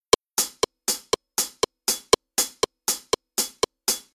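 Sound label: Rhythm Bar Beat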